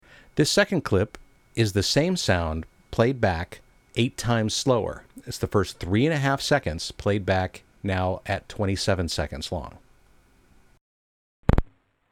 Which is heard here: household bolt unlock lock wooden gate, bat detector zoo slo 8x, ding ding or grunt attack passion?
bat detector zoo slo 8x